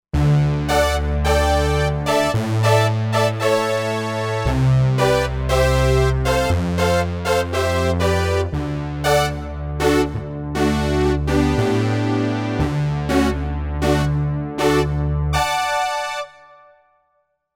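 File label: Brass Matrix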